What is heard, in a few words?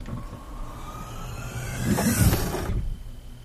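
Hatchback Hydraulic, trunks & hoods; Hatchback Hydraulic No Bumps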